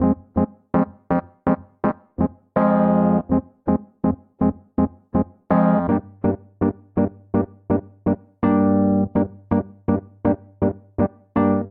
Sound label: Progression Rock Soul Swampy iv - v - iv - v - VI - VII C Minor 82 bpm, Electric Keyboards; Ableton; Factory Packs